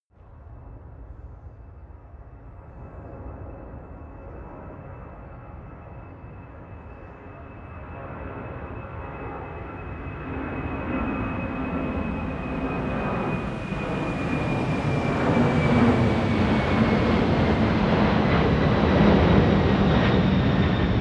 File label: plane flyby land tonal howl; flugzeuge verkehrsflugzeuge; verkehr transport